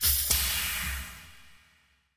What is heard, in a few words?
Hydraulic Release, pneumatic